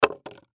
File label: Gift Box Lid Set Down Cement; Lids (Sound Effects:Objects); Foley; Blastwave